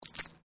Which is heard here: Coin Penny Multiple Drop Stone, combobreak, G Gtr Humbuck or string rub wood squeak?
Coin Penny Multiple Drop Stone